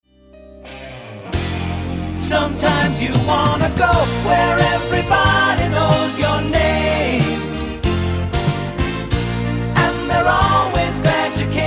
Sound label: Cheers